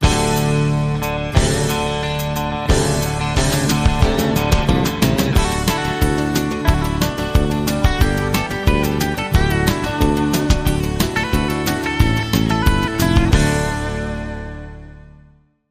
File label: Flatbed Shuffle